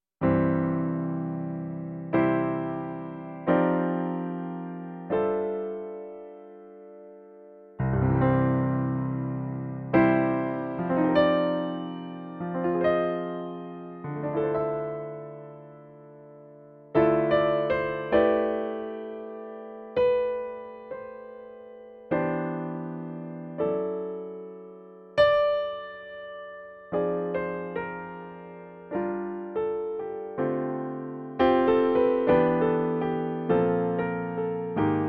Yamaha Grand

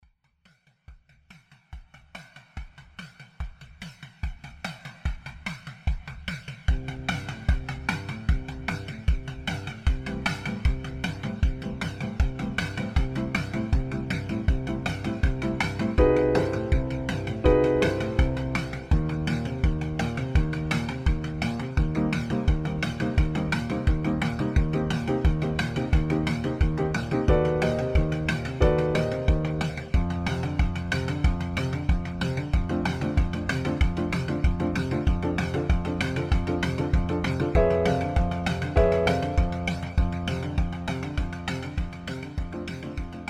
Rotary Fun

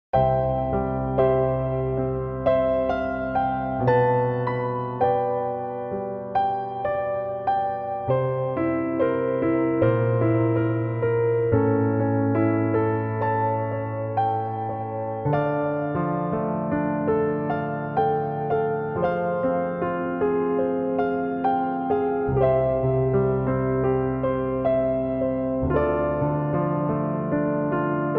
Steinway B Cinematic

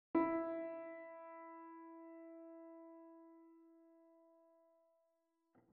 e 2 mp Pianoteq

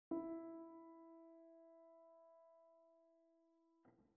e pp Pianoteq

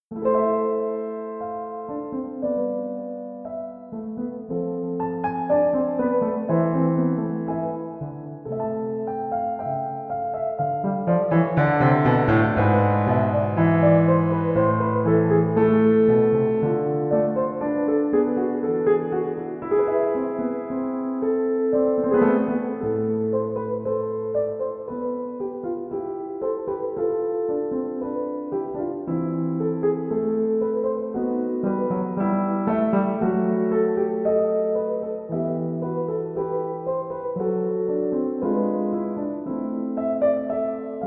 Fantasia A minor, Blüthner Model One Grand Piano; J. S. Bach; BWV 904

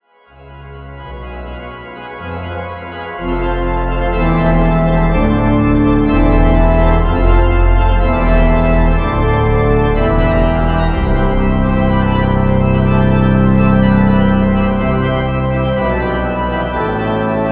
Toccata extract

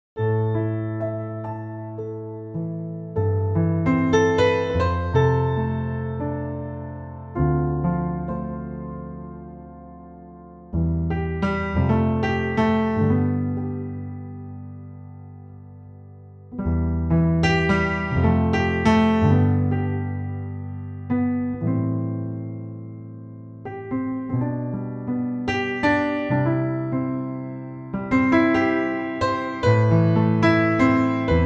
Bluthner test improv

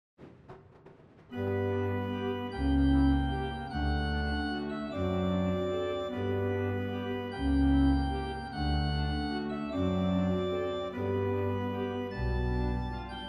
OrganTeq II; Schuman Kinderszenen 01

